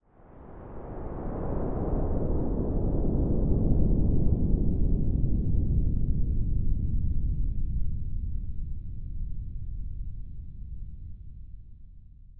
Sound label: meteor woosh; space-exploration 0.5.80